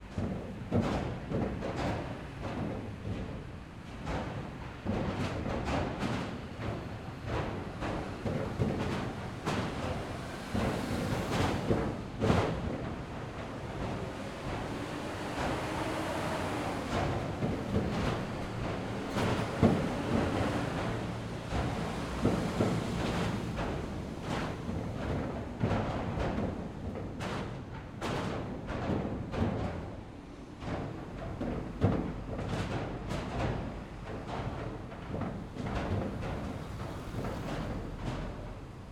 Boat, Ferry, C Class, 7 Ton, 12000 hp, Bow, Ext POV, Cars Exit over Metal Grate - a few faint voi; a few faint voices